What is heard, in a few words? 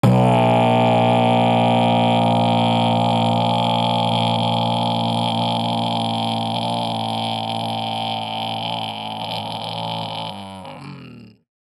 Throat Bass Long; Aawaz; Gully Loops